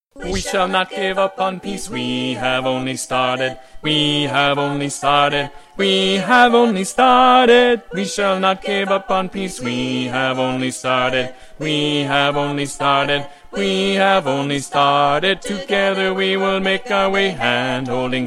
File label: We Shall Not Give Up bass